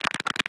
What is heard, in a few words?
Finger Crack